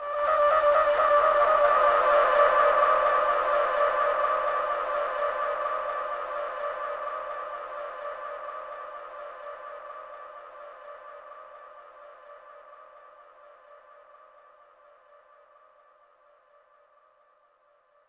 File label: Trumpets In Dub Trumpet D4